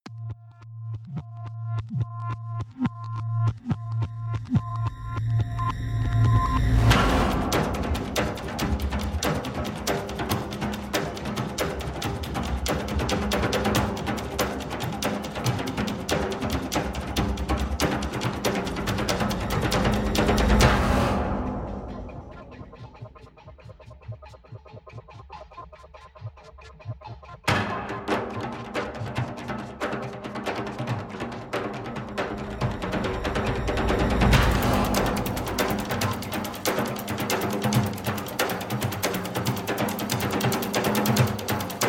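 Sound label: with small percussion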